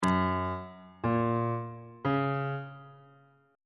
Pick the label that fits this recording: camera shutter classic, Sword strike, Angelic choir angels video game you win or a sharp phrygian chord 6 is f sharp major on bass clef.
a sharp phrygian chord 6 is f sharp major on bass clef